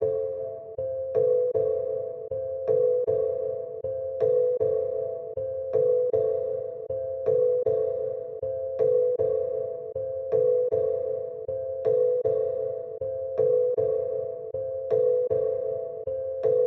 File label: 157 BPM A Min Mallet, Loop Stems; Melodies; Melody Mystery Expansion; Frost